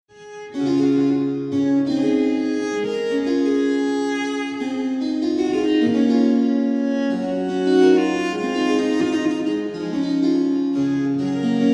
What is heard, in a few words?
pipa